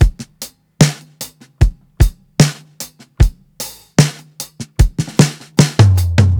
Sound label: 75 BEAT, DRUMS LOOPS; BASEMENT DRUMS VOL 2